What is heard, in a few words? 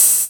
Cymbals OH, Open HH; Vengeance Samples